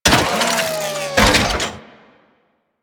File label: Ship Catalogue Close; Warframe; Corpus; Ship Remaster